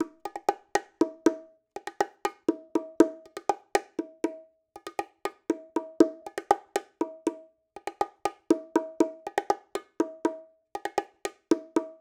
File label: latin bongo loop 80; perc construction loops; bongos latin; SEISMIC Drum Sample Pack; Slate